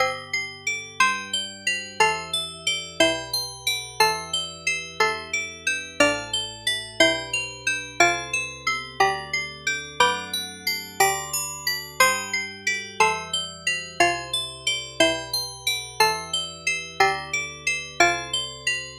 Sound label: glockenspiel